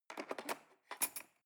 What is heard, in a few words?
cutlery cake fork drawer scrape